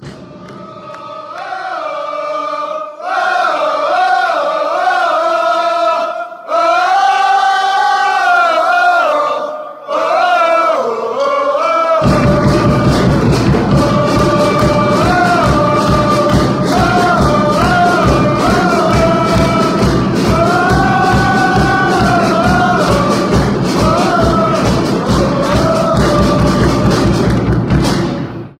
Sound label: drum beat